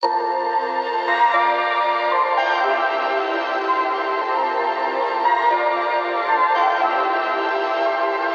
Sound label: Melody Loop Synth Pad Em 115 BPM LoFi Ambient